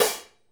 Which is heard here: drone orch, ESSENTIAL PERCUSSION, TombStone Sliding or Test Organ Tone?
ESSENTIAL PERCUSSION